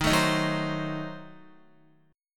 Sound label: Eb Diminished 7th, Guitar; Ebdim7 strummed; x,6,9,8,6,8; 4F,4A,5C,5G,5C,6E; JGuitar